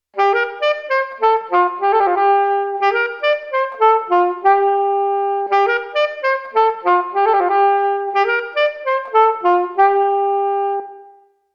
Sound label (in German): Sony DRE 2000 Saxophon Royal Opera House London